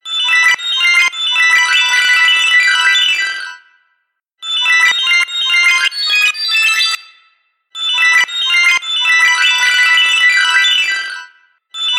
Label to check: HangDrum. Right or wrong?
Right